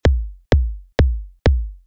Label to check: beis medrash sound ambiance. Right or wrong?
Wrong